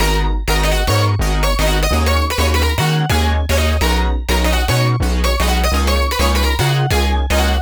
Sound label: droploop epic; lead arp chord melody